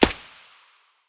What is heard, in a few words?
sig550 silencer distant; weapons